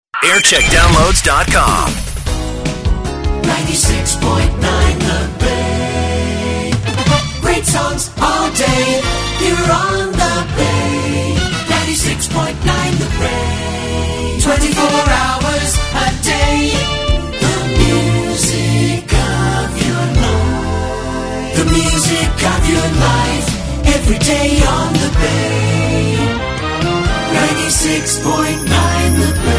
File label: Jingles